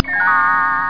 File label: Magic Appear; Chrysanthemum 1.01